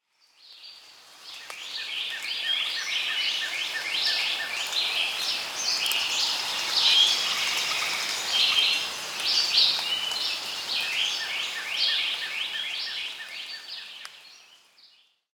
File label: Monkey Crowd; Pitch Black Audio Pong